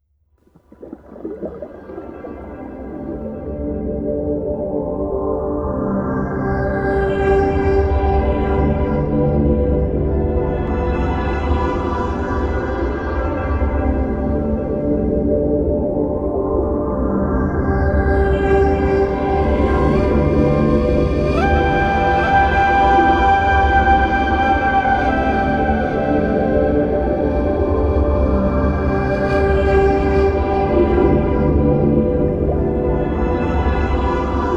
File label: underwater